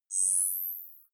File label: zoom out light high